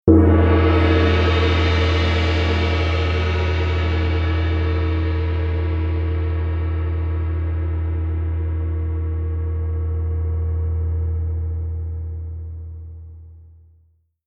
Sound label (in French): son gong grand